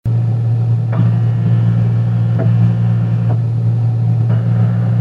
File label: rattling, samsung refrigerator